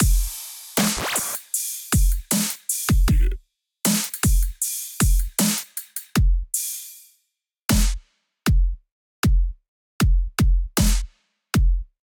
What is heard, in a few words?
Dance Faction Kit groove 78 bpm